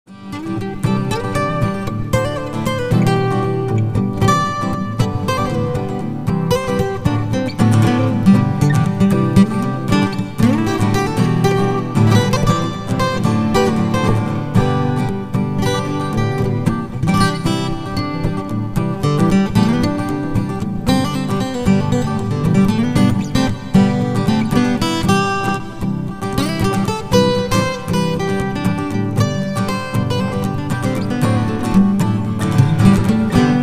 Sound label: acoustic express